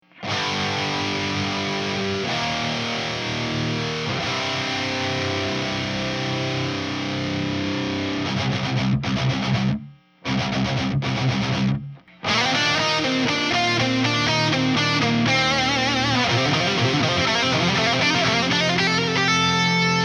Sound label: amp